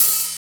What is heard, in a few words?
OPHAT